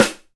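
good snare one shot A key, steel snare drum tuned to the A note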